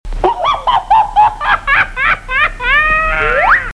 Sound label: Laughing Sound Effect